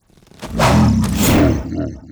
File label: WAR ATTACK